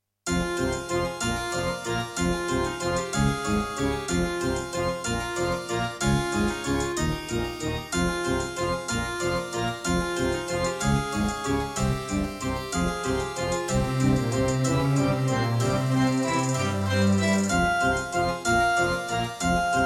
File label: Yamaha DTXpress; Death Gate